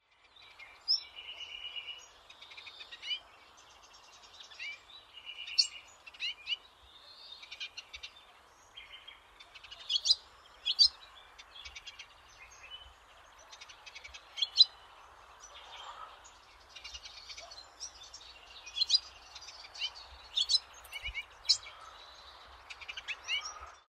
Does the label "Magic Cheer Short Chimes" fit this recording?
No